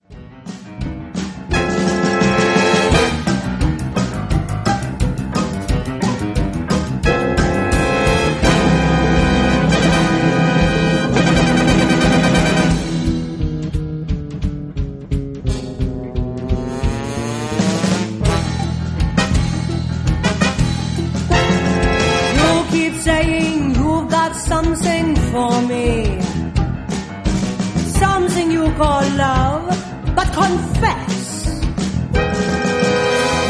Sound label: orchestra